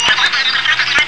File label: funk; Amiga Soundtracker Sample Packs